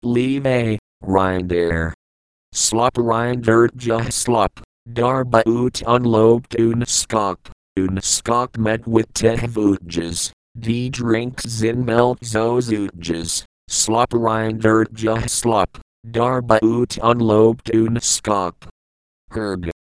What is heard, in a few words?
lullaby